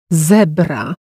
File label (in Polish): zebra